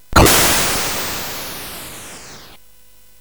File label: Frantic, CSG8580R5